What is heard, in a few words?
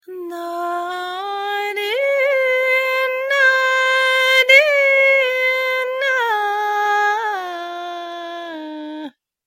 Female Vocalist